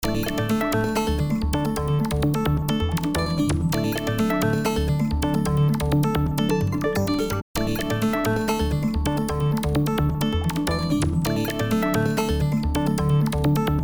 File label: ringtone